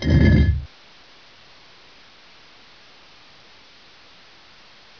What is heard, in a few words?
stone in